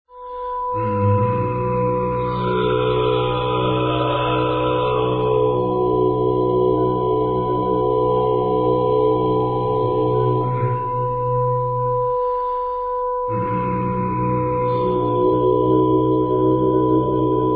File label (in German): Tiefenklang